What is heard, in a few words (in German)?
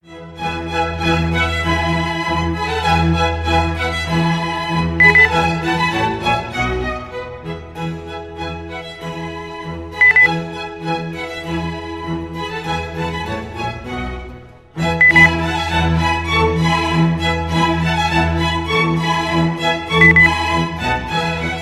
klassik 4 jahreszeiten 1 satz beep